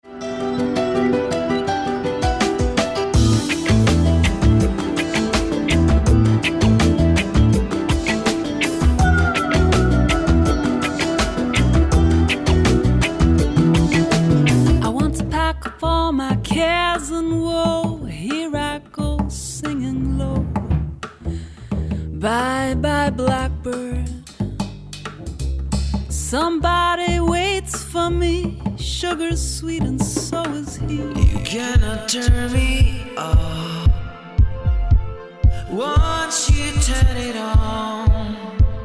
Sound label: Lounge